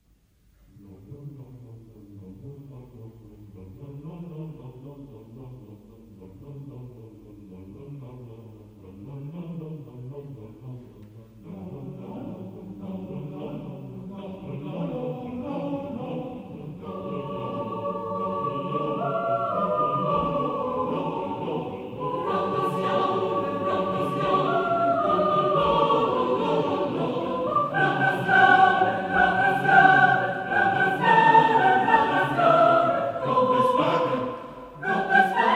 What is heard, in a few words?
choir